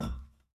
catwalk, footstep

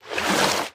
slosh

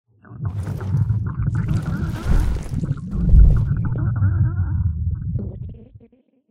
struggle

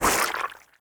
resin, alien

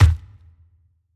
step, alien

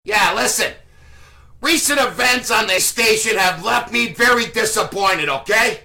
disappointed

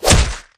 gen hit, weapons; Paradise

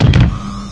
powerloader step; mecha

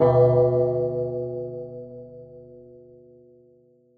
knell

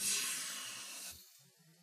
window door, machines

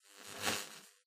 sparks

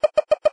terminal displaying, machines

